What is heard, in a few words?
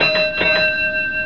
Train Bell; TinyTown